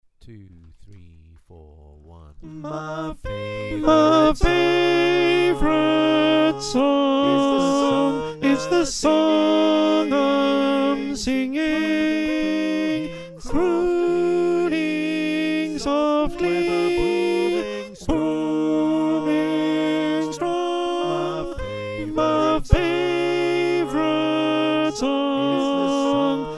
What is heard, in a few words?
Alto, choir